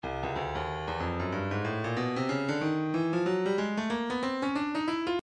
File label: breakbeat midi 093 bpm street stick; Stylus Breakbeat Midi Files